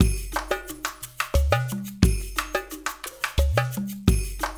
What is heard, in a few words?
loop, percussion; InVision Interactive; Percussion Slam; RULE WORLD